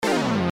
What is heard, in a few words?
erase; End of Aspiration; android